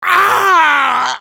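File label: pain crticial death, French; Hurlement d'agonie 4; Engineer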